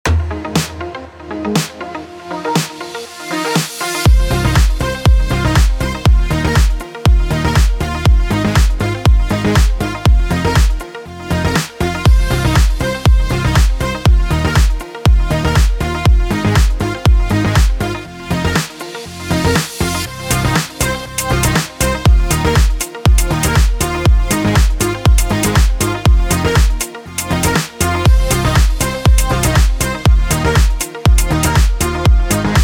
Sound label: BED POP FMIN 120BPM